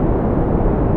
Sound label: ARP2600, modular syn